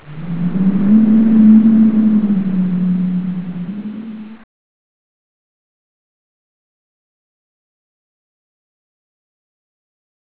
biltz pow, ambience; dod

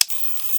camera clip out, weapons; cstrike

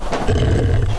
push box; gearbox; debris

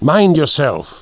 scared; svencoop

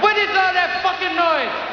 noise; svencoop

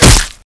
axe hit body; svencoop

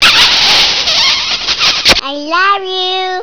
kiss

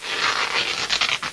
bandage; firearms

